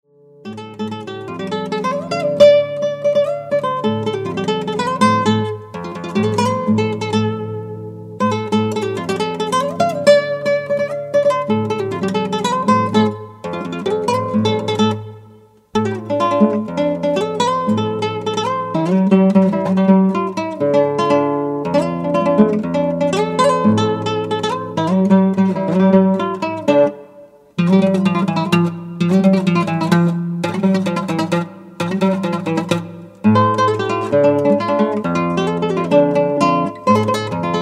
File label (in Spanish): DANZA DE LAS TIJERAS